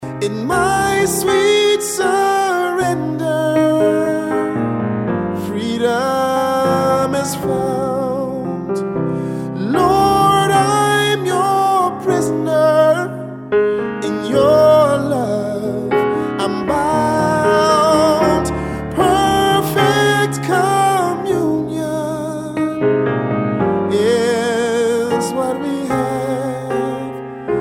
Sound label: ring tone